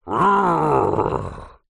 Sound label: fantasy giant angry growl